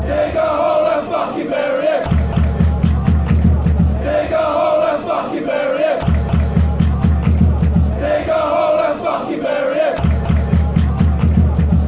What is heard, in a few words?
fan chants; dig hole cufc